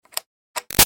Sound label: 1/4 Inch Plug In Out Electric Guitar; Switches; Objects; Big Room Sound